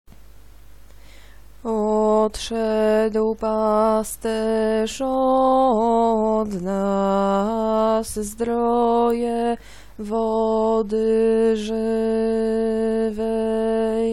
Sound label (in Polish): tenor; Wielki Czwartek; spiew na triduum